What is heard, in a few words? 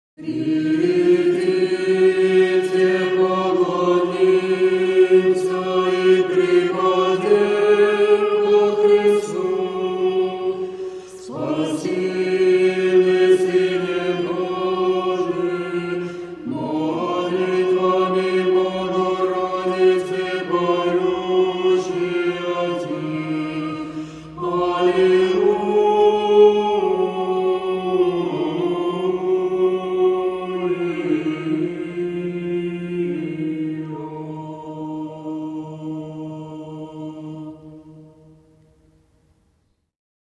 Come Let us Worship, Church Choir; In thy Dormition thou didst not forsake the World, (O Theotokos)